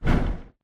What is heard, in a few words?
bt footstep jog parts; Titanfall 2